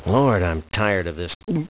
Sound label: drown; quake2; hd